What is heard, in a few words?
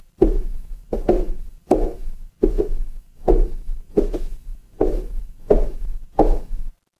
footsteps wood